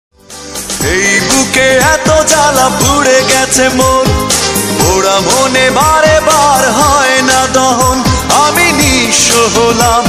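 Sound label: bengali ringtone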